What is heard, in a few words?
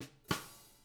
JZ70 SWING, Living Drums; Sampleheads; Peter Erskine